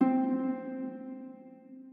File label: harp